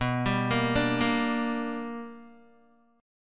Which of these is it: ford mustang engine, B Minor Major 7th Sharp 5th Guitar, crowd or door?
B Minor Major 7th Sharp 5th Guitar